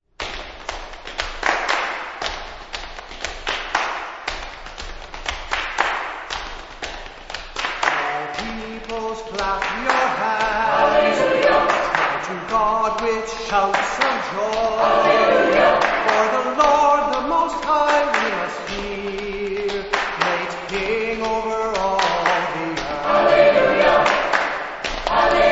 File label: all peoples clap